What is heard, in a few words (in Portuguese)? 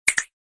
estralo de dedo